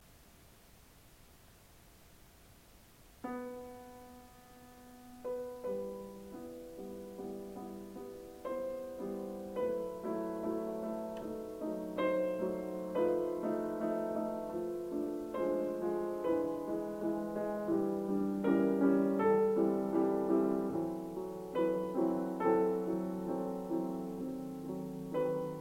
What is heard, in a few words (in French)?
Chopin Prelude Mi Mineur; budapest